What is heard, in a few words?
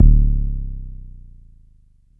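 Long bass drum, Jomox Airbase99, Drum Machines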